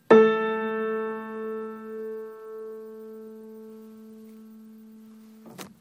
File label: Baldwin A3 A4 median just octave no checks